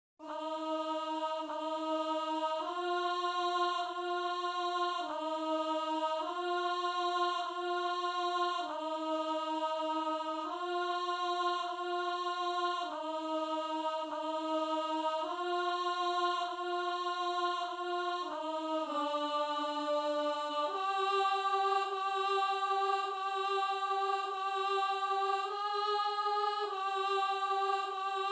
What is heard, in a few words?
stabat mater contralto